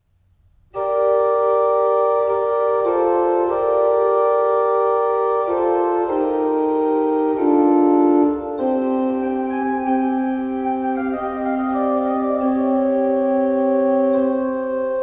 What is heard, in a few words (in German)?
Orgelvorspiel; O Jesu, all mein Leben bist du